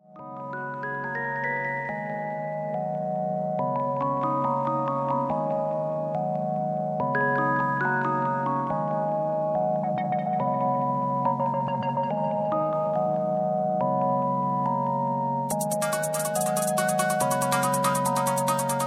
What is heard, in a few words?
i used play guitar